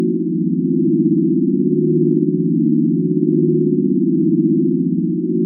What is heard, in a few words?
Padsynth Forcefield Loop